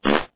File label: fart